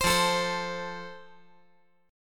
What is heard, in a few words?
F Major